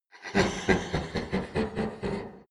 demon laughing gloating; kreaturen

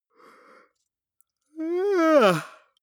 male yawn vocal high; koerpergeraeusche; menschen; soundpool

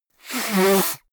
nose blow

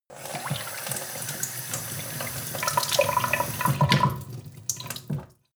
sink water pot soft; industrie-wirtschaft; gastronomie

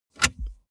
car bmw e46 interior drink holder take bottle

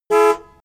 car horns

